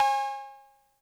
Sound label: Cowbell 808 Color